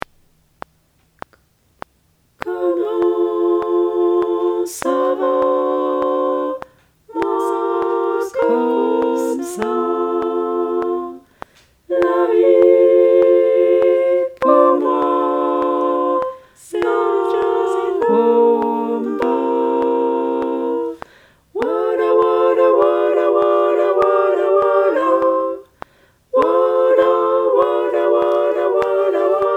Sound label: Tutti